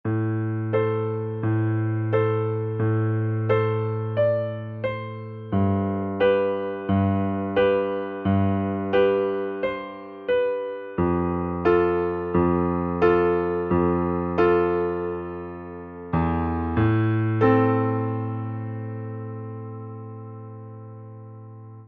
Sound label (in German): konsonant zwoelfstufig